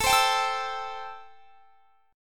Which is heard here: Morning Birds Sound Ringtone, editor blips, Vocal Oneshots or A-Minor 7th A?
A-Minor 7th A